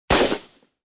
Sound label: gun